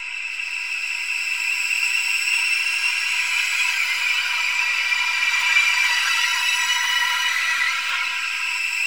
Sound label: SQUEEKIES; Distored Reality